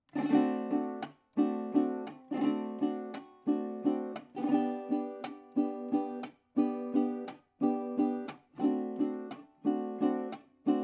Vals Sol Mayor

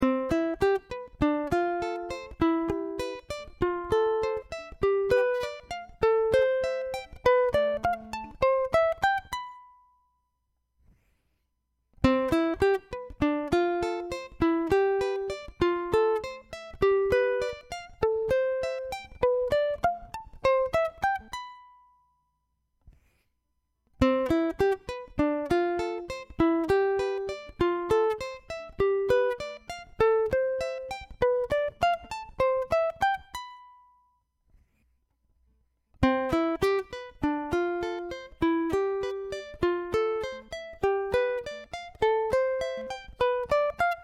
C Escala

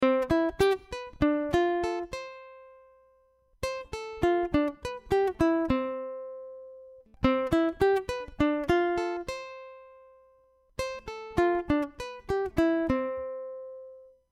C Escala Back Pause

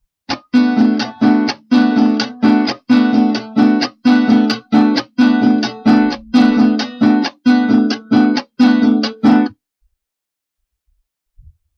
merengue

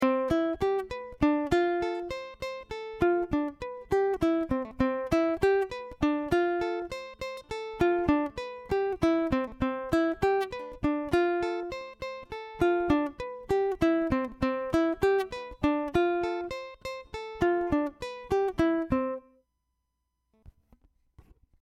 C Escala Back

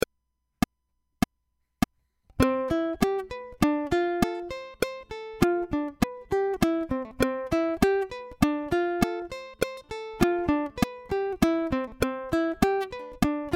C Escala Back Click

